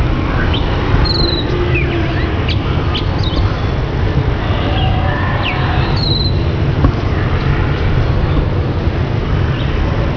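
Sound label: Magpie robin, birdcall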